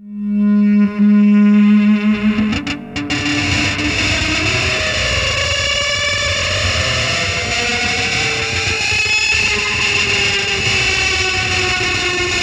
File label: Feedback, Industrial; Nasty